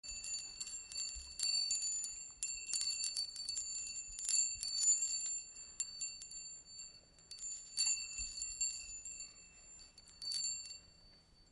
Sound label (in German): handgloeckchen